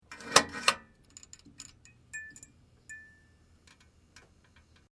Lamp Chain Pull Switch Flourescent Light Clinks On; Chain sounds; Metal Sound Effects; Lynne Music